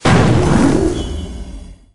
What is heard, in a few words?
golem walk